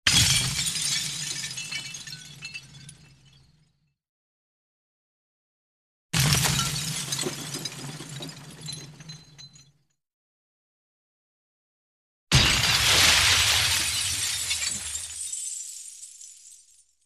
impact glass x3; SMÅ FÖREMÅL

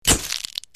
bulb, KAMERA BLIXTLJUD

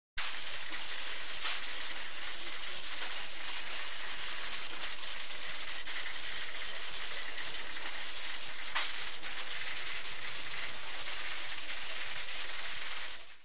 printer; DATOR; SKRIVARE

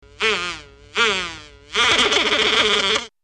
fly buzz, insekter

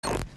Zap, TV

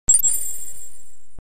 cartoon chime; TECKNAT

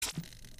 Zap, TV

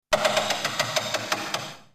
lotto wheel, NÖJESFÄLT LOTTERIHJUL

